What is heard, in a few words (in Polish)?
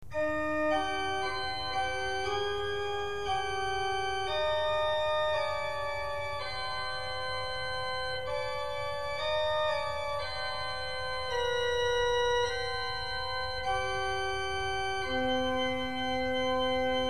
mixtura z 8, organy